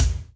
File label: BD Rock, Yamaha DTXpress Acoustic Kick